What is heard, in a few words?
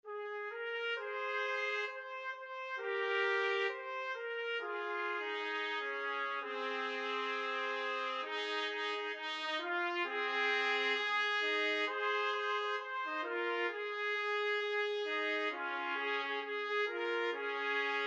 this little light trumpet duet